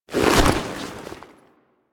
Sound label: Idle Screen Foley Fast; Warframe; Corpus; Corpus Lich